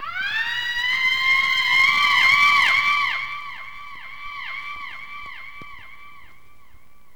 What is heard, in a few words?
scream distant scratch; ooh ahh